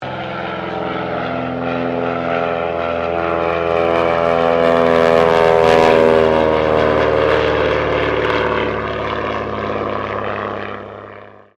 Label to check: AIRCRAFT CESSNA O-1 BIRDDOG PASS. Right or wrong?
Right